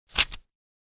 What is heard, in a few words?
page flip